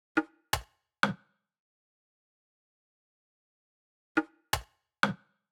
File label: Perc loop, EDM 120 bpm; Future House; Drop; Ableton Projects; Big EDM 120 bpm (Drop) Project